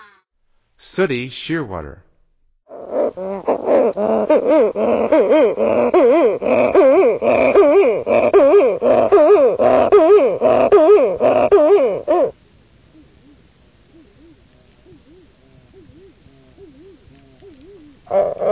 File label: Sooty Shearwater; North America; Stokes